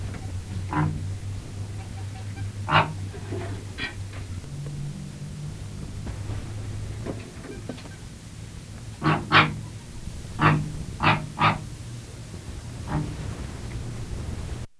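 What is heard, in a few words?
canada gan, カナダガン 鳴き声; 上野動物園; 多摩動物公園; 北アメリカのガン。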